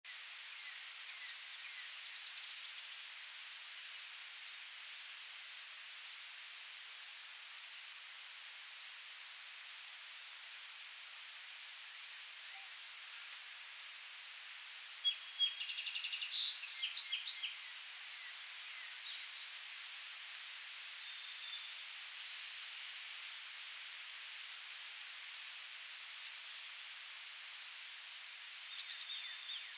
loops and wind

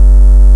Hum Low

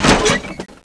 door metal locked; bigcity

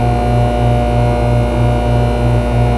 electric hum; de season

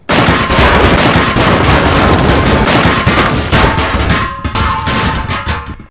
fuel depot, ambience

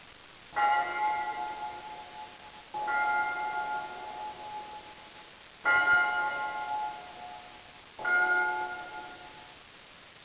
bells

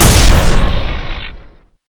laser

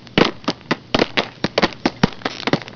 box

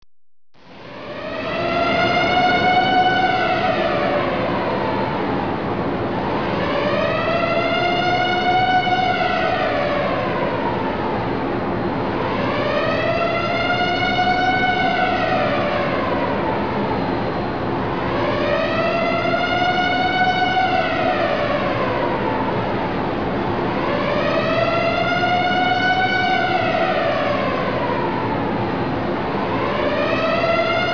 air raid; 2017 ag crossfire

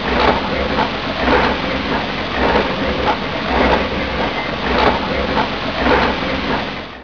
pumper

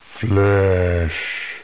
zombie; de compclub

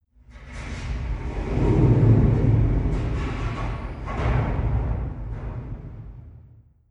cave hit

